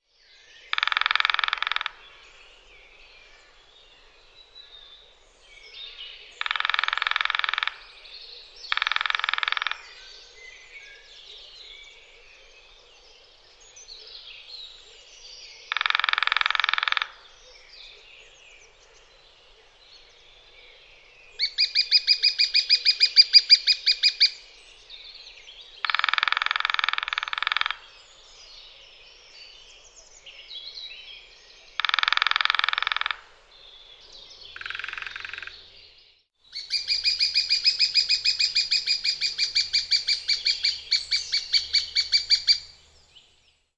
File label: Dendrocopos minor